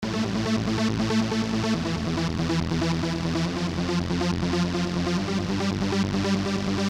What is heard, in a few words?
bkground; A trance synth suitable for a driving background sound.